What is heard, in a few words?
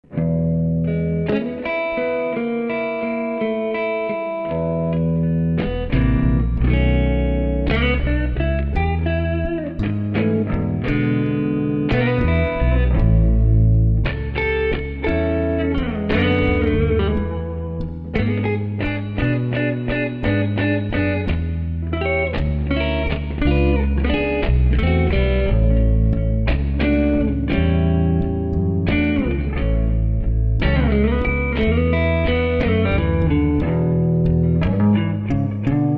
blues